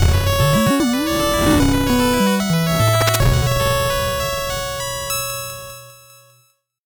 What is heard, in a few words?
8bit jingle slow